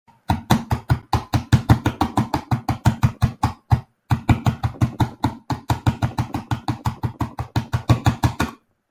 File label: clicking